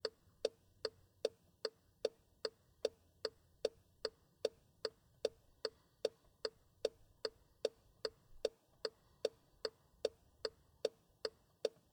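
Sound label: car turn signal sounds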